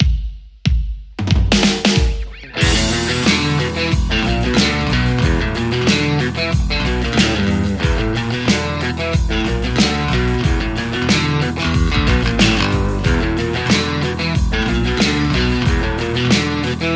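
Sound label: Stratocaster JCM800; mercuriall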